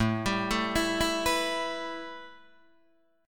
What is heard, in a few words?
A 7th Suspended 2nd A Guitar Open D, chord